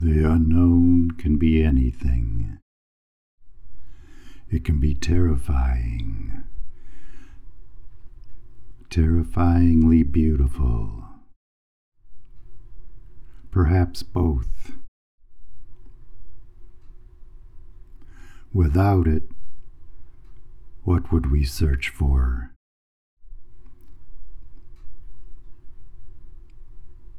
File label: raw vocal